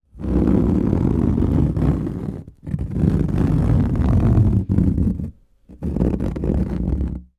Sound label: foley scrape fingernails on wall thin wooden